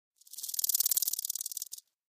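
Insect Clicks Warning Threatening - Rattle Snake Type Rattles - Stag Beetle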